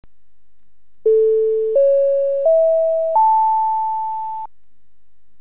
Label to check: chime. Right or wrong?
Right